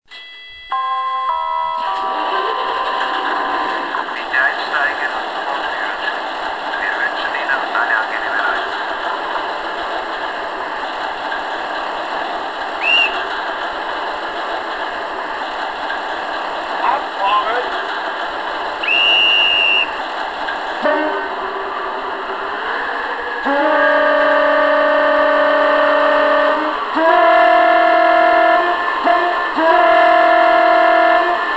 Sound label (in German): Rangiertraktor